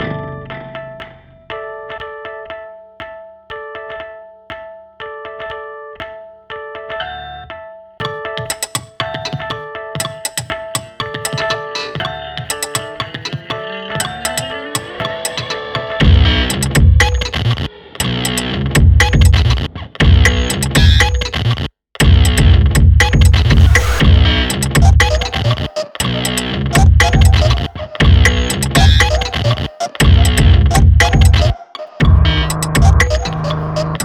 Guitar SFX; Splice Explores

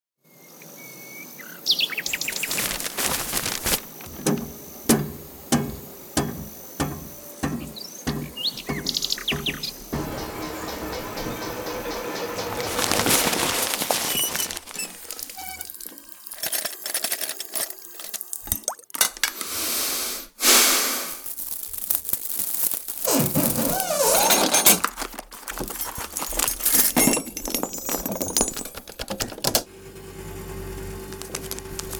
Foley Sounds; Ghosthack Sounds; Ultimate Foley Sounds

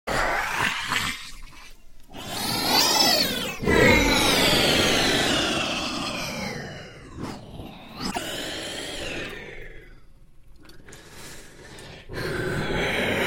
Cartoons; Big Room Sound

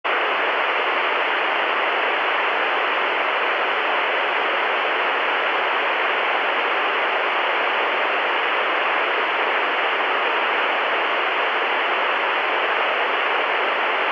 TVs; Blastwave FX

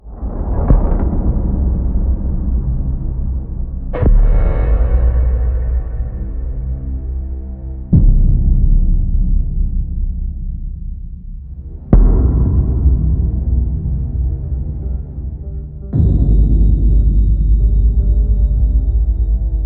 Booms; SFXtools

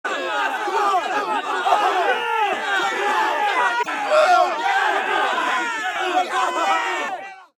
Fast Food; Blastwave FX

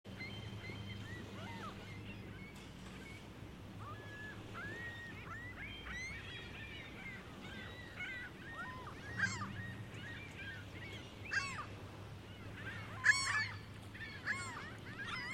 Birds; Blastwave FX Birds Bible

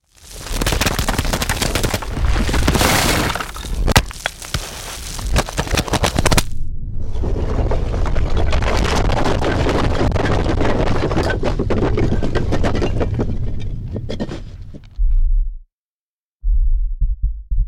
Earth Movement; Big Room Sound

